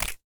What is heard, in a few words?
camera click